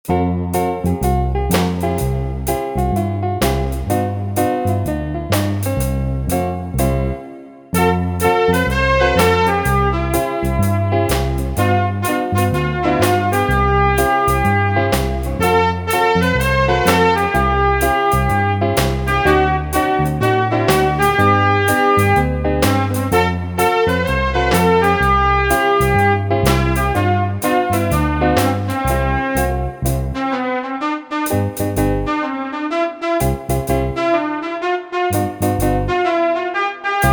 Pista con melodia instrumental

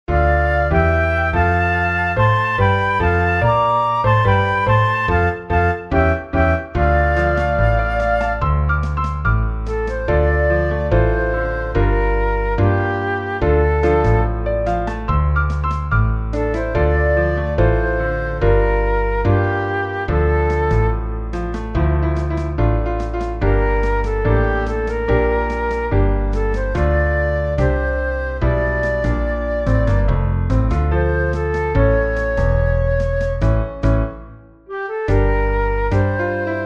Pista Instrumental sin melodia

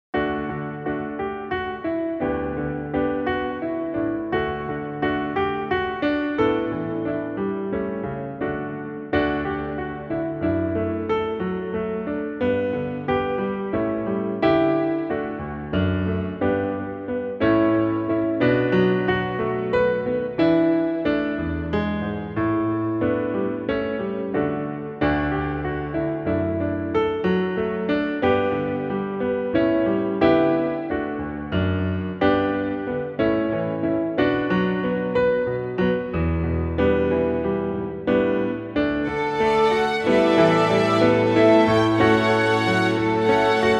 Pista Instrumental sin melodia

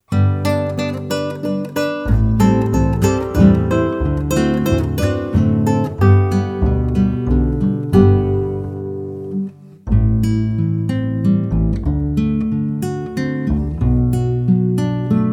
Pista instrumental sin melodia

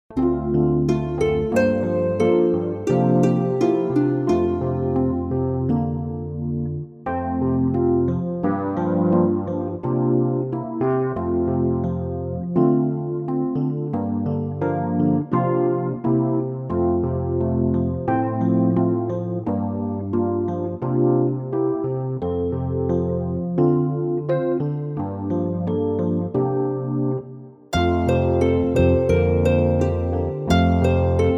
Pista instrumental sin melodia

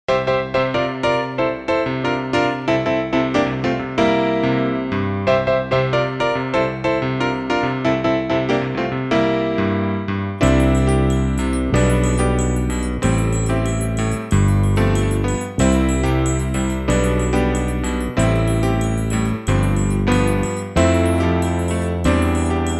Pista instrumental sin melodia

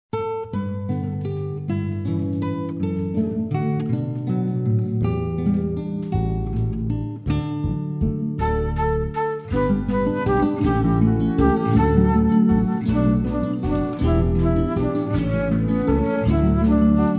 Su Paz. Pista con melodia instrumental

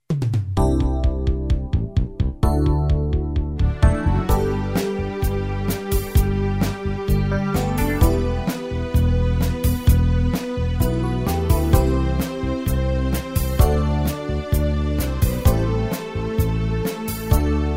Pista instrumental sin melodia

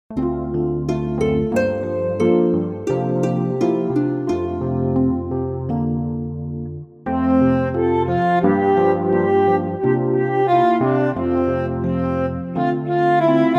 Pista con melodia instrumental

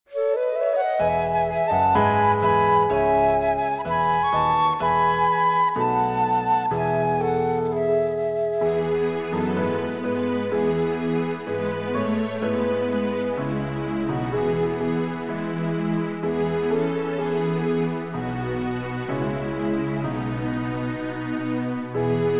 Pista instrumental; Aleluya

